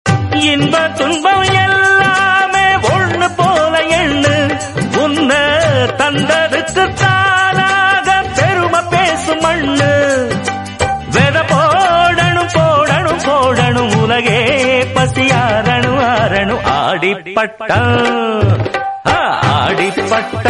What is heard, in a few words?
Tamil Ringtone